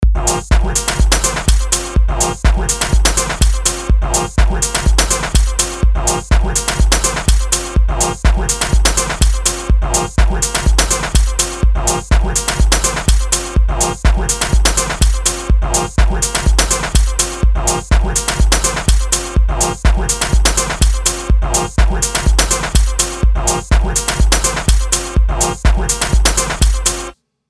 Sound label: Cajun; Retz